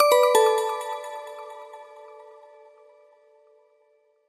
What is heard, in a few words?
notification request